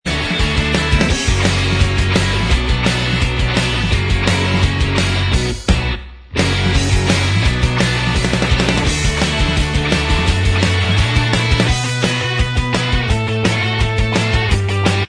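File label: instrumental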